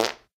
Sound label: Fart; DynamicEuropa; Hungry Europans